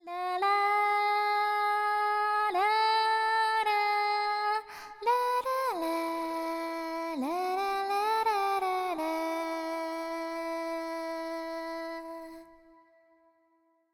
BGM ADV Sing Forest; aster tatariqus android ios mobile gamerip 2023

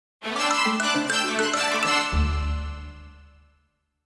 Fanfare Finding of a Treasure; Vandal Hearts 2 Heavens Gate

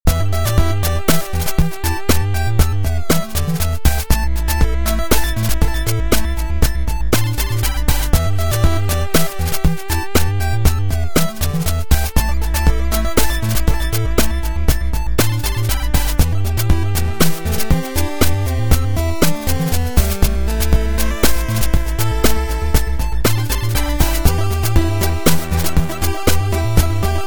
song; Robot Wants Fishy

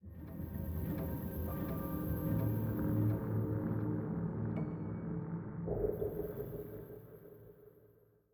85bpm; Research High

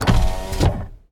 Car Window Open Close Whir

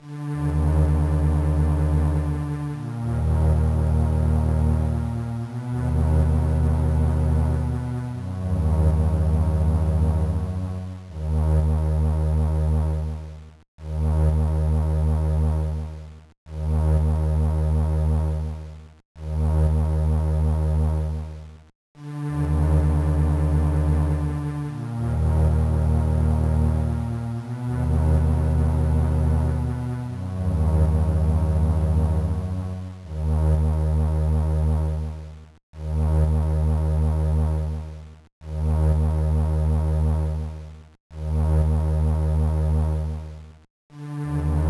Environment Sound (Silence); Metroid Fusion 2002 GBA

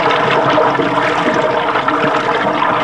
Jetpack Soda Loop; Rabbids Big Bang; Android iOS Windows gamerip 2013

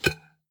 metal pot soft hit

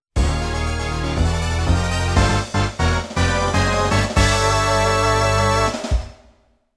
jingle ~ start race

